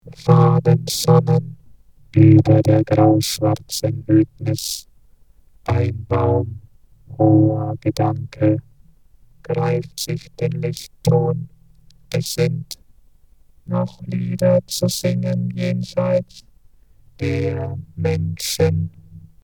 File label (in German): Orgel